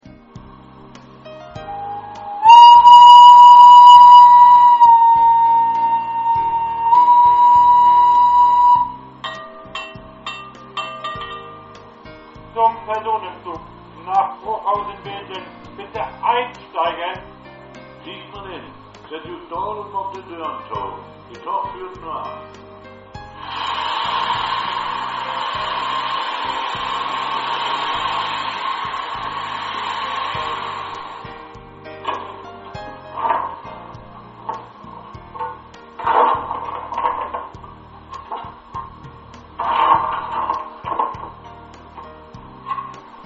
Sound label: Dampflok, Steam Loco